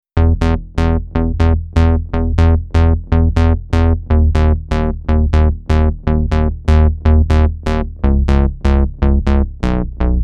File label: Bass Loop Drone 122BPM Ebm; Unison Artist Series; Mac Depulse